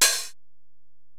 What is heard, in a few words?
HiHat, Alesis DM-PRO